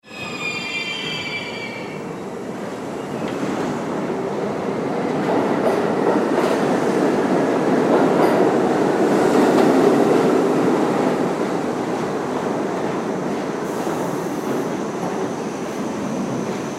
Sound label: Subway Approach Terminal Interior Rumble; Transportation; Subway Sound Effects; Subways (Sound Effects:Vehicles); Blastwave